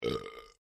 MALE BURP MEDIUM; Male burping; Human

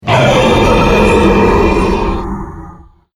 Dinosaur Demon Anger; Dinosaur Sound Effects

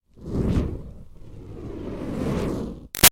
Fire Torch Whoosh Lite Moves; Flame Sound Effects; Objects

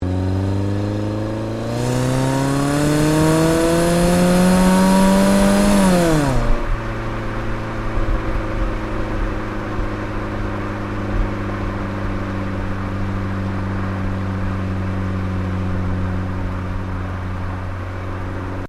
Car Ford Focus Accel Gear Change Exhaust; Ford Focus hire car; Cars; Vehicles

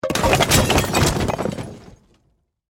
Crash Wood Rock Glass; Materials; BlastWave

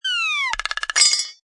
Cartoon Whistle Clanks, Funny combo with dinks

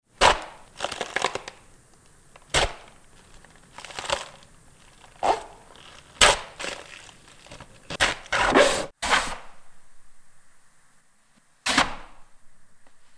Stabs Knife into flesh various; Stabs and Slices; Combat; Tom Hutchings

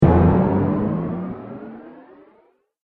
Cartoon Rising Hit; Cartoon Sound Design Sound Effects

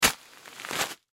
Rock Salt Bag Drop; Rock Salt Bag Drop Fall; Blastwave; Foley

